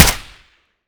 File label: fire 762 sup, mk23; ARC9 uplp fesiug